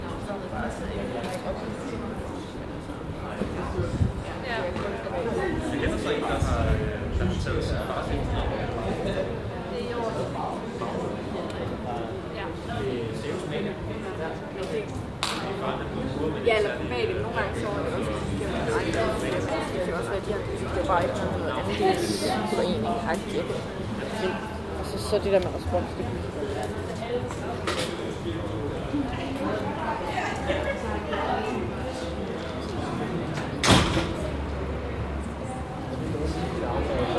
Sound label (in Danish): gaardlyd